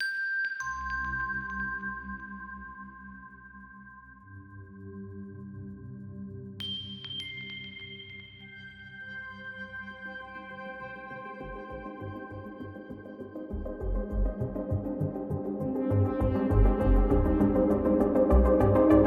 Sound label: Pulsing Night Underscore; Narrative Mind